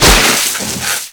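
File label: water pipe burst; Explosions; Call of Duty Modern Warfare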